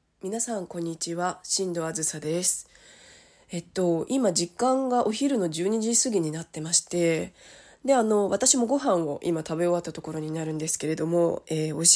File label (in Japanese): おしっこ実演, お風呂でアルミホイルの上におしっこ